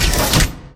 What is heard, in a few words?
door round blue unlock